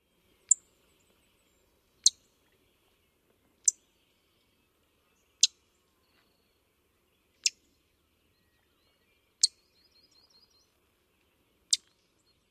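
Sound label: Palm Warbler, alarm chips; NY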